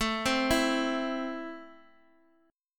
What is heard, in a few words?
A Minor A down Guitar Open G